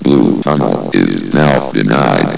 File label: blue tunnel closed